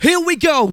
VOX SHORTS; Megabass Remix! 2

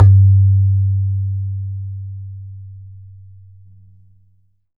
BAYAN; Q Up Arts; Denny Jaeger Private Collection

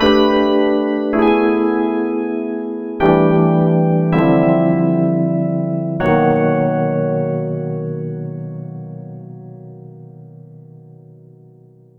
piano (jazzlike); Sonic Foundry (Sony Creative Software); Ugly Remnants VOL-2; Knutrix Demo

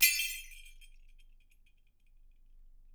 CLANG, Percussion; Miroslav Vitous

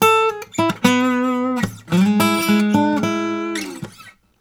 Blues Guitar; ILIO; Fretworks; LICK

